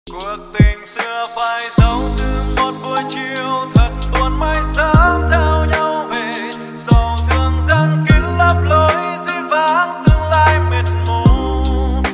lofi cover

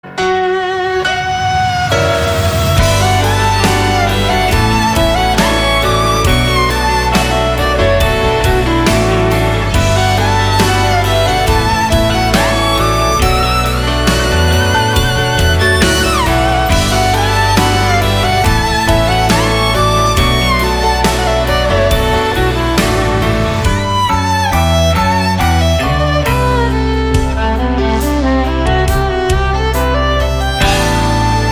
nhac chuong vionlin

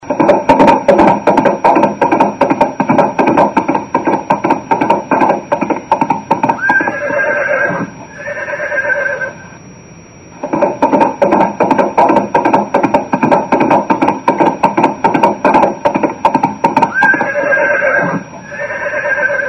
Tieng Ngua chay phi va hi

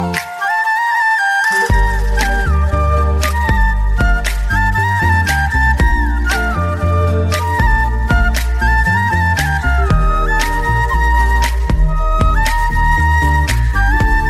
Flute Khong Loi; nhac chuong; cover

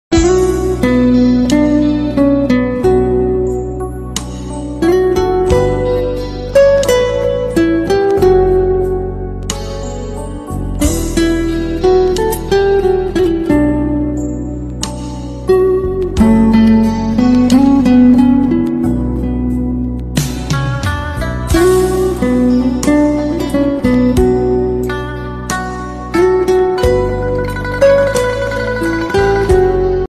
guitar hoa tau; nhac chuong

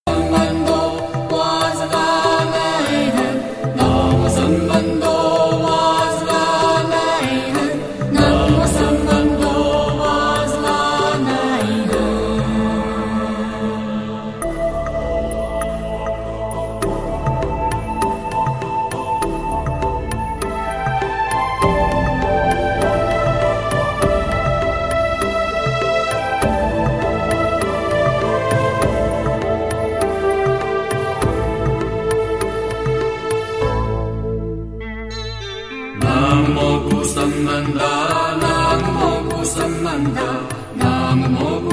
thần chú tiếng Phạn; Bat Dong Minh Vuong; hang phuc ma quy

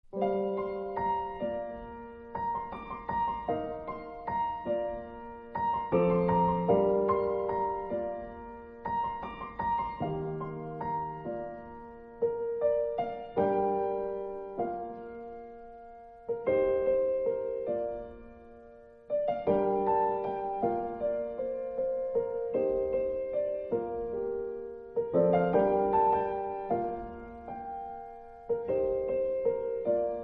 Piano Khong Loi

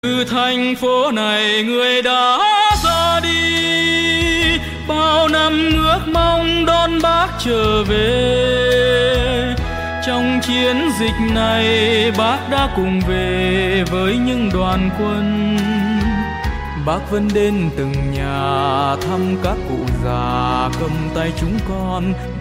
trữ tình, tiếng hát

